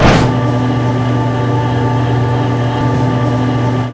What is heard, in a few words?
door move; gesource; doors